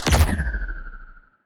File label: healing projectile, weapons; Stellaris; biogenesis